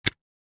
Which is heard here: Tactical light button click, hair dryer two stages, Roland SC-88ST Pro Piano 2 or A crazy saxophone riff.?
Tactical light button click